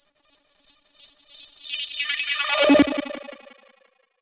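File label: alarm siren, filter processed, 170bpm; musicradar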